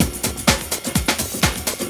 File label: loop; SoundFactory; SMPMANIA